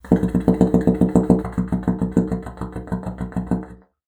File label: BASSOON; Xsample VOL-6